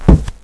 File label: carpet; valve